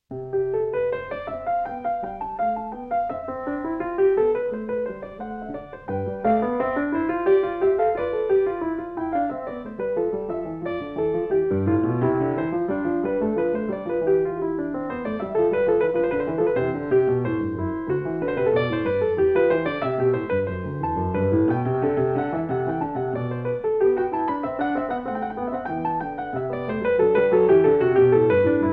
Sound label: Sinfonia In C Major; 15 Sinfonias; J.S. Bach; BWV 787